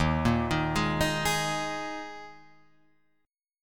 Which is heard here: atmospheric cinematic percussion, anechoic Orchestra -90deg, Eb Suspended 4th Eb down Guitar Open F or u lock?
Eb Suspended 4th Eb down Guitar Open F